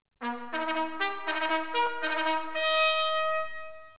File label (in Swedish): attack, signaler; dragoner